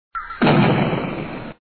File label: bomb; christmas adventure